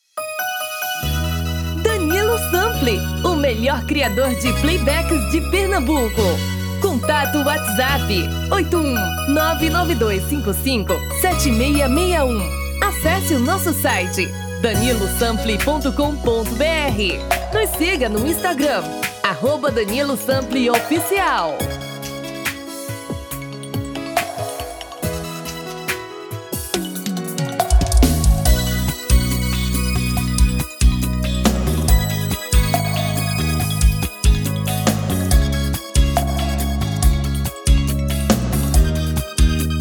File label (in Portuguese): Brega TOM FEMININO